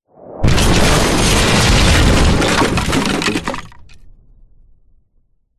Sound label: bomb drop massive explode debris